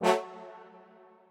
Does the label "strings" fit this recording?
Yes